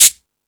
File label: Percussion, Drum Machines E-MU Pro-Cussion